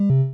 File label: Noise Off Quiet; TaSTT